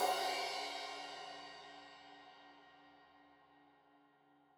Crash 15in L edge, Cymbals Stereo

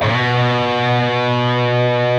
PRS C, Guitars, LEAD; Spectrasonics; Hans Zimmer Guitars VOL-1

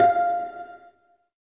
SYN PIZZ, JD 800; Sound & Vision - Gigapack I CD 2; JD 800/990

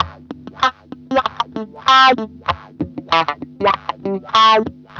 WAH, WAH WAH GTR

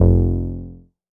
bass F1, ARP Odyssey

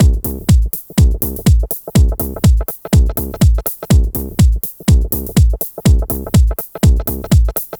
123BPM DRUM, Drum Loops

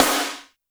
snare, Roland R-70

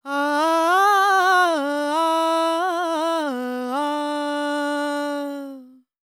World Voices, INDIA; ILIO; Vocal Planet

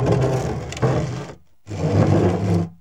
BOX SLIDE, Box Noises; E-MU Producer Series Vol. 3; Hollywood Sound Effects

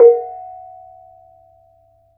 BONANG BB4F; Spectrasonics; Heart of Asia

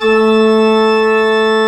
A3, CHURCH OR; Best Service; Advanced Media Trax Modern Composer

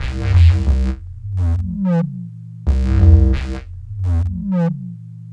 Lick Bass, Drum & Bass; Fluid Dynamics

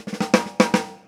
Fills 111; Zero-G; Monster Beats

